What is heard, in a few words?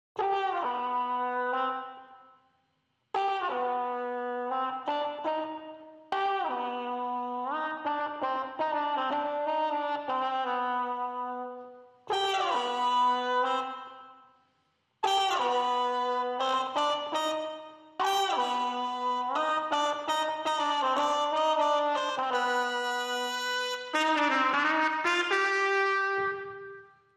Trumpets